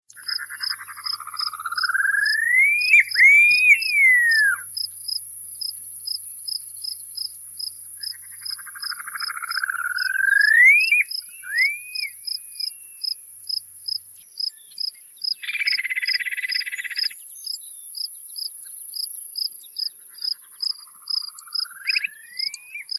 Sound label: Upland sandpiper bird call